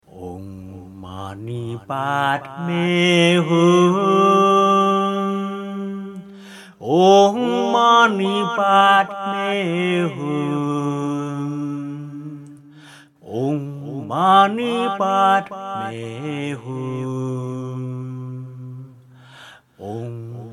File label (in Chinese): 六字大明咒(唱頌); 四臂觀音; 又稱觀世音菩薩心咒